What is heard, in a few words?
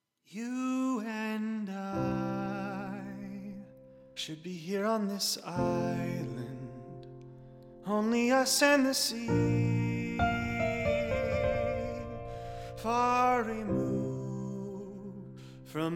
Ballad. Piano. Romance.; Debusey style; For Movie project, Sync